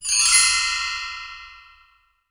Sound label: wind chimes; Greytsounds; EXOTIC PERC